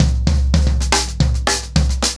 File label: 110BPM, SNARE TOM SOLOS; Sonokinetic - The Roots Drumloops; ACOUSTIC DRUMS